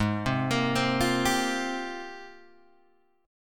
Ab Major 13th, Guitar, Open G

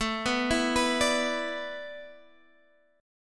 A Suspended 2nd Suspended 4th, Guitar, Drop D

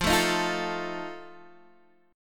D sharp Suspended 2nd Flat 5th F

